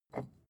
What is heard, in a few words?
glass bottle pick up light